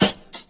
2000s strong bottom end steel snare drum sample g key